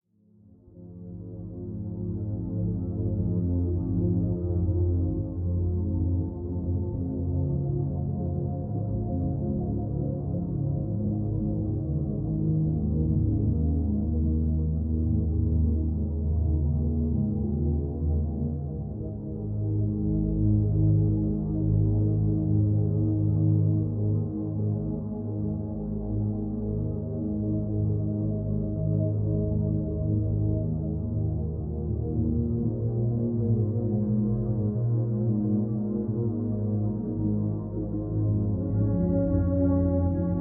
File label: Throat Chakra 192 Hz